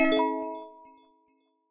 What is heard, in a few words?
lock open; puzzle blocks